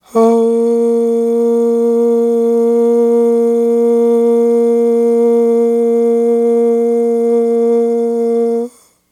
AHHH, Vocal; Polyend

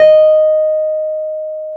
PNO, Wurly

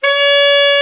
BRA ALT, SAX; Best Service Gigapack 1

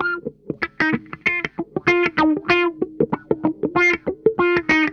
NOTE, Funk Guitar; Zero G - Funk Guitar

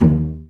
CL PIZZ D1; Best Service; Peter Siedlaczek's Advanced Orchestra

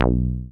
SYN 303, Roland TB-303

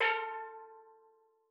A3, STEELDRUMS; Xsample VOL-4

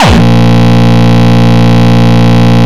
Longkick, kicks

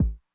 Kick Accent Mid Tone Mid Attack Mid Decay, 909; classic machines

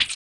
RSS, 160 BPM

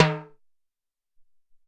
Timbal

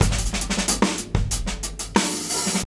DRUM 91

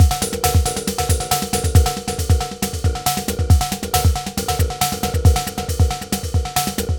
SPECTRUM GROOVE, HYPERSPACE PULSE GROOVES

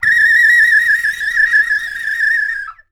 bird call

